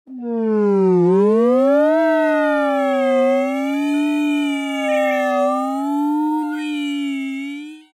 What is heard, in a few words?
flute voice